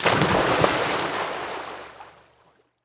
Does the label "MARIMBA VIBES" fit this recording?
No